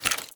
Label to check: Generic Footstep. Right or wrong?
Right